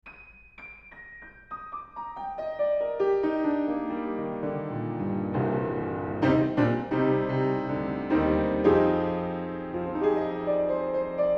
Piano Horiz Closed

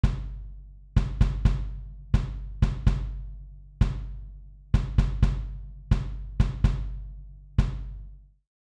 Kick No Trans Proc

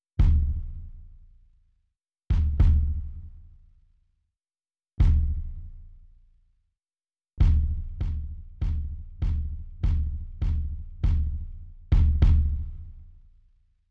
Kick Trans Proc

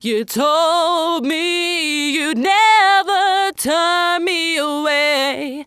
Pitch Corr Auto Detect

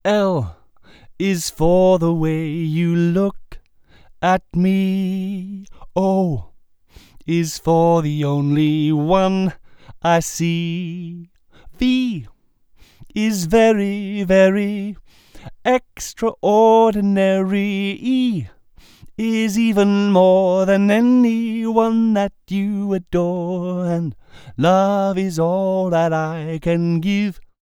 Pattern 0deg Fig8

